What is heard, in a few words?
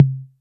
High Quality TR 808 Synth Tom C Key, tr 808 electronic tom sample